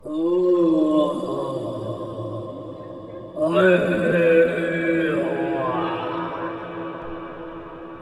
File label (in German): Monster; Geräusche